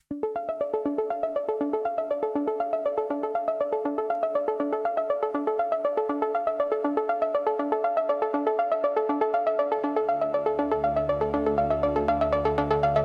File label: Electronic Impulse